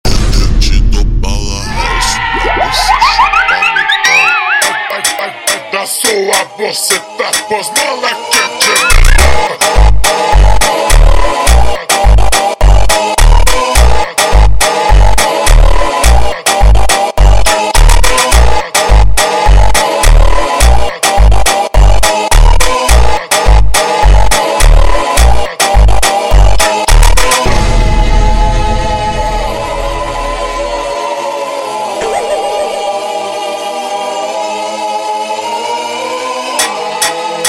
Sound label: Funk super Slowed